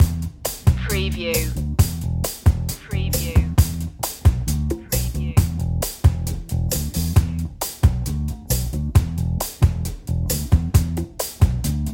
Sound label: easy groove